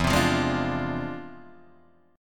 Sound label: E 7th Flat 9th Sharp 5th; E7b9#5; strummed